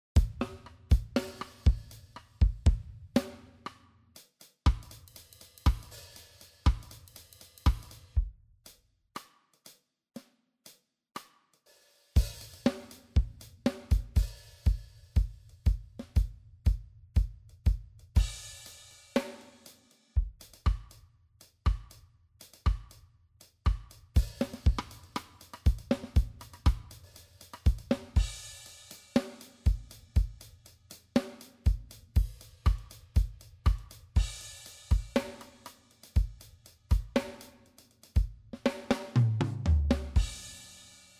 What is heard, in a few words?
MOOER Drummer Reggae Pattern Stereo